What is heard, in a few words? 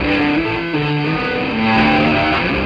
Electric Guitar